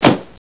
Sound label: parachute; DR BRAIN; BUGS